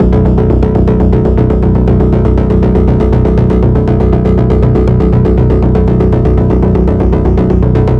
Trailer Pulse B 120 BPM; Dilemma; Ghosthack

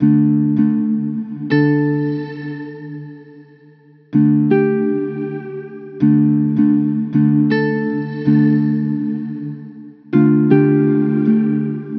Musical Loop 80 D Minor; Promise; Ghosthack

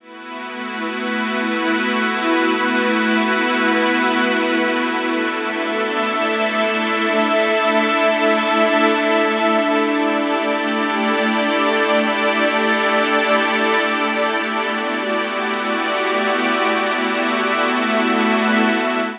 Musical Loop 100 Synth Strings A Minor; Ghosthack

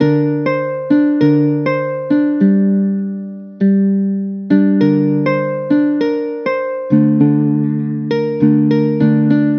Musical Loop 100 Swell D Minor; Ghosthack

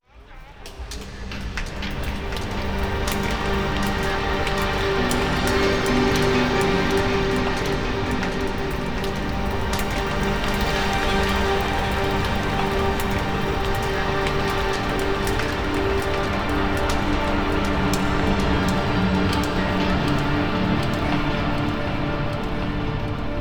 Granular Texture Swarm Gm 60 Bpm WET; Ghosthack

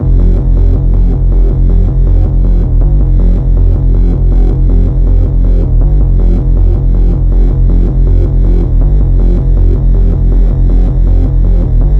Trailer Pulse F 80 BPM; Life-Force; Ghosthack

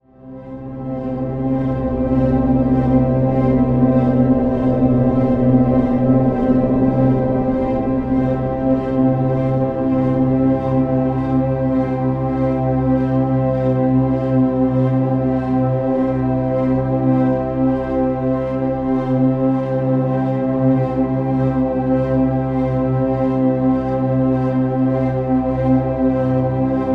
Atmosphere Adrift C; Ghosthack

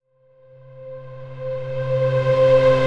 Riser C; Obscure; Ghosthack

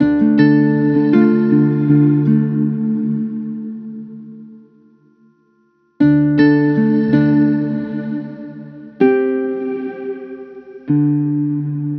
Musical Loop 80 D Minor; Covenant; Ghosthack

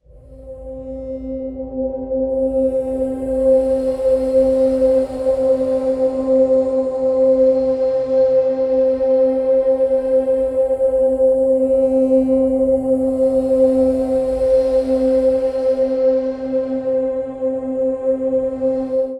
Drone Cm 100; Ghosthack; Helios

